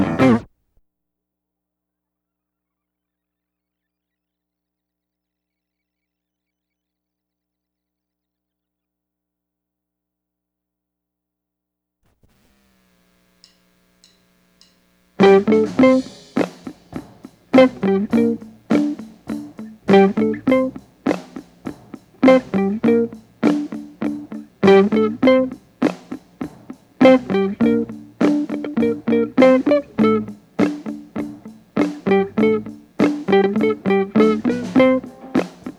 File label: guitar